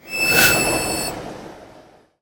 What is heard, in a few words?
Ice Spell Whoosh, Cold Whooshes; Crystal Chill; Arctic Aura